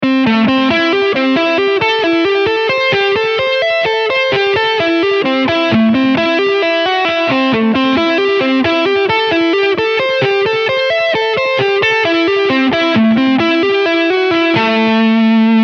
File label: LAmin7